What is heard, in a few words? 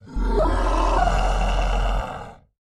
werewolf; soundscrate